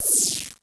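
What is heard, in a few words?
Klone Dual Percussion Synthesiser noise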